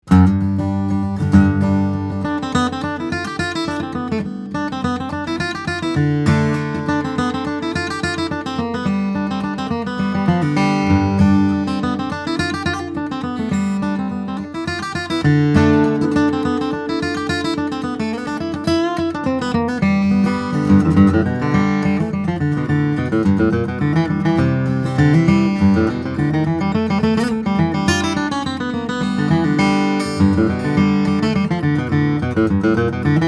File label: Sexauer Dreadnought, steel string guitars, flattop; brazilian rosewood; adirondack spruce